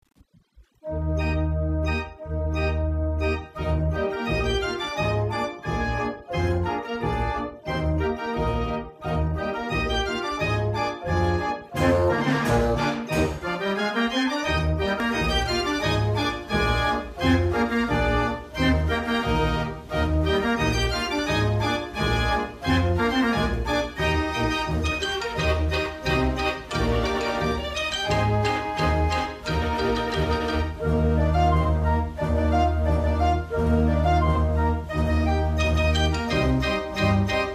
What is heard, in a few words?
sweedish rhapsody BELGIAN, belgian band organ